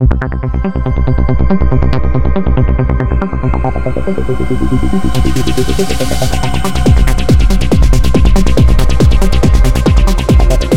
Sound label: psy trance